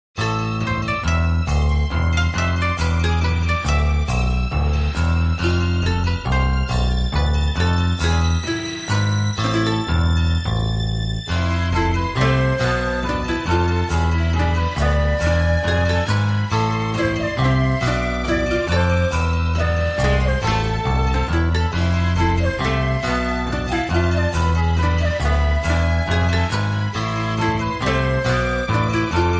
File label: Japanese Style, Koto, Shakuhachi, Pan Flute, Strings, Percussion, Tambourine; Triangle; ShamiKoto; Syntheway